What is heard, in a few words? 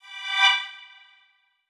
Solo Shimmer; HintsStarsLite